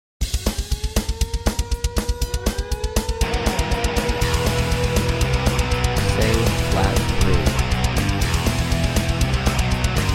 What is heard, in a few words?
Alto Key of E; real heavy metal ear training